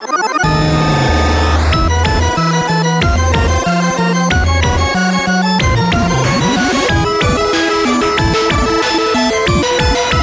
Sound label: 8bit BGM